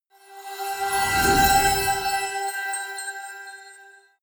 sweep

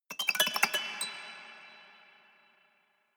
haunted strings tinkle sequence fast short hard

